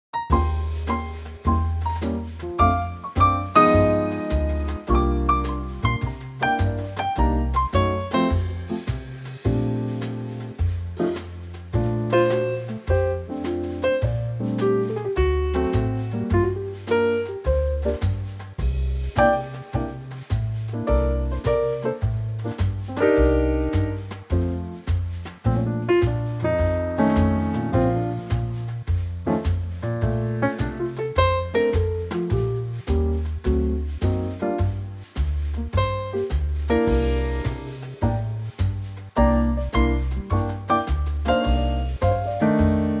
Bb Trio

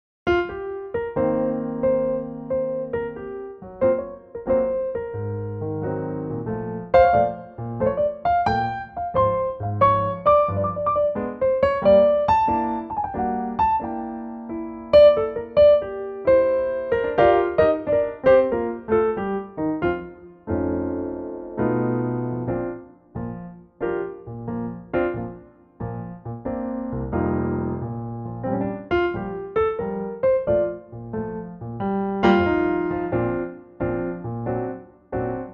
Bb Piano

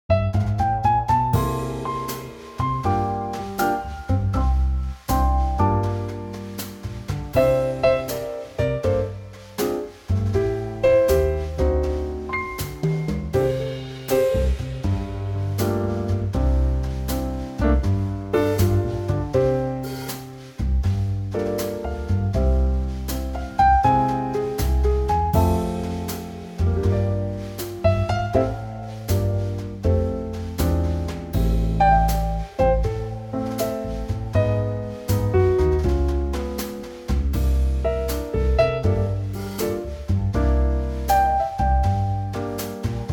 F trio

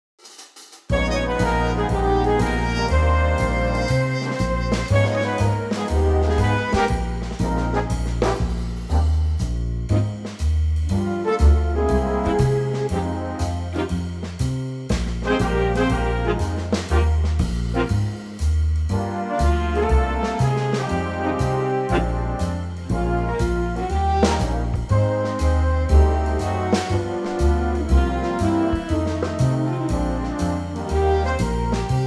Big Band Eb

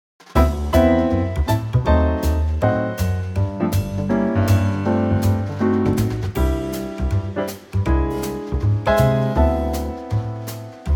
C Trio

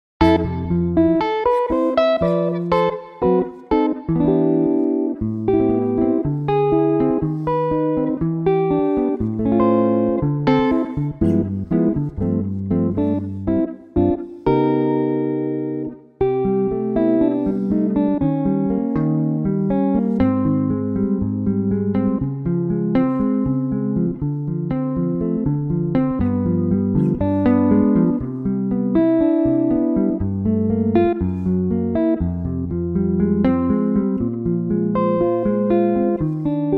C Gibson Gtr

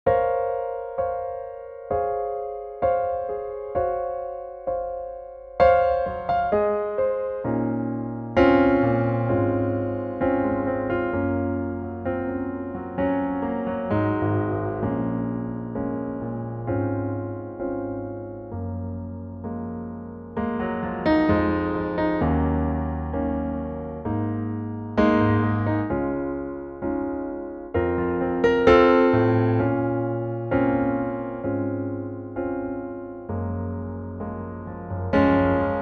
suddenly Bbm piano; Lovely piano only arrangement; key - Bbm - vocal range - Eb to Ab

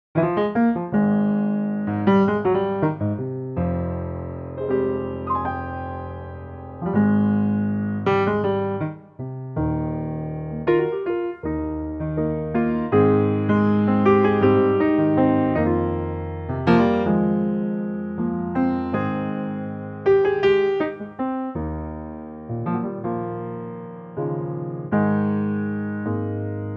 Ab Piano, male

